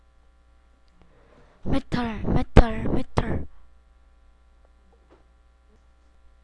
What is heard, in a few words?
metal